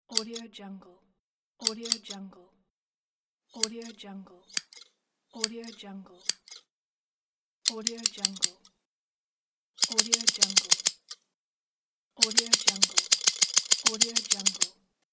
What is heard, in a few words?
scissors